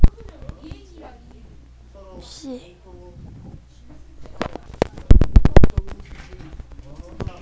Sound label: sea